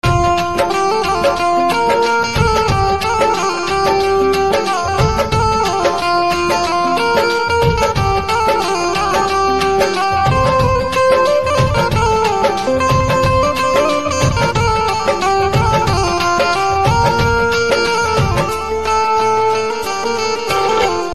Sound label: Banjo Instrumental Ringtone